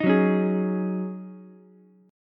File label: Eb Minor 11th Eb down Ukulele C6; Ebm11 strummed; JGuitar